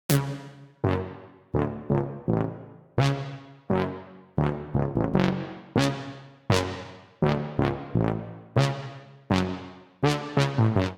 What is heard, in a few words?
Funk Lead F# Minor 85 bpm; MIDI Clips; Tonal; Melodic; Ableton; Factory Packs; Chop and Swing